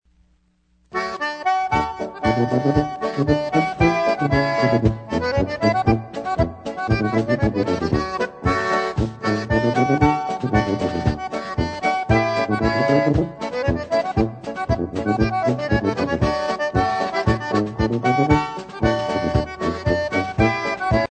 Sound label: LINDENAU POLKA